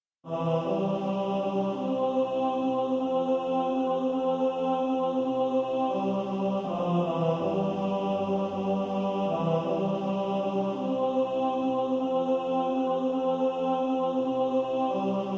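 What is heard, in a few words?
tenor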